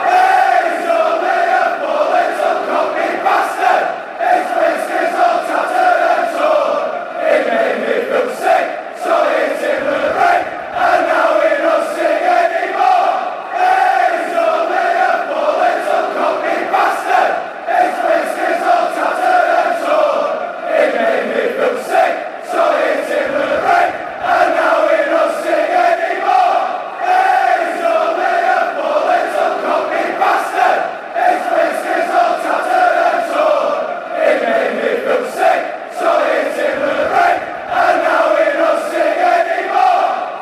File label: cockney batard fanchants